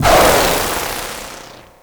PvP End Of Match Burst

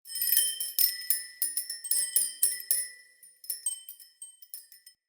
Tiny Bells C; Fey Hub

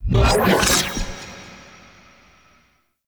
Shield Aura Deactivate; Warframe; Iron

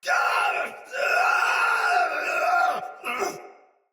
Pain Heavy Soldier Sword Agent Male; Battle; Sinecure Melee

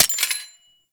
Mech, Weapons; Gotva Prime

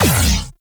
Gatling Laser Shot; Warframe; Railjack; RailJack Weapons

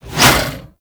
Scythe Whoosh; Warframe; Weapons; Stalker Scythe

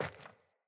Sandals Walk Metal; Player; Footsteps

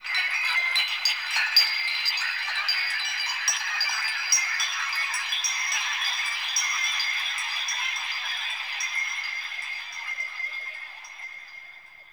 Possessed Texture, Ambience; Warframe; Shadowgrapher

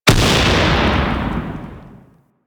Raptor Mortar Explosion; Warframe

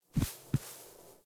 Puppy Foley Stand Idle To Sit Idle; Warframe; Kubrow

